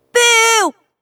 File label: booo; mlp; rainbow dash; unused